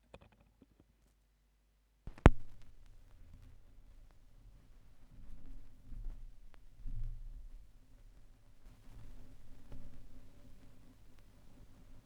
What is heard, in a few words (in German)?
schallplattengeraeusche, Vinyl